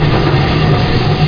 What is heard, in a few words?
pizza wheel; Heretic II Demo